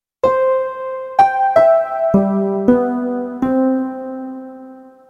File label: Ster Piano; Kawai K1 Presets; Bank 2 card A